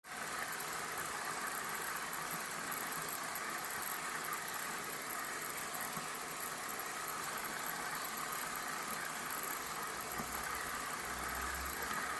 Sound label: noise high pass filter; lbp6240